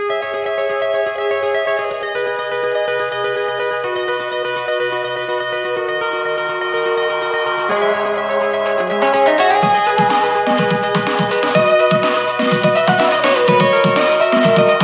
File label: Lute